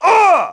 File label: pain, grunt; nimble grunt